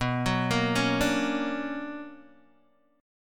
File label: B Minor Major 9th, Guitar, Open D